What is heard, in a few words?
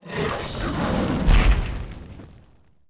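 hellhound attack special, monster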